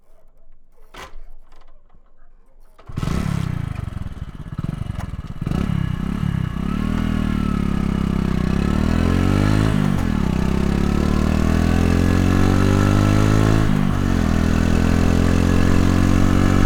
Motorcycle - Kick Start - Riding - Fast Speed - Slow Down - Indian; Splendor; The AudioVille; Motorcycles of India